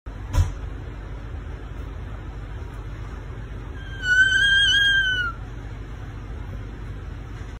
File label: Kit fox; وولپس ماکروتیس